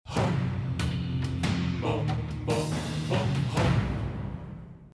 level Win; tak bites

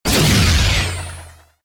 smartbomb; tak bites

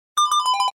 secret Bonus Short; tak bites

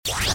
tooth jump; tak bites

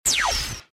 tooth appear; tak bites

